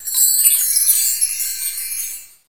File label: BELLS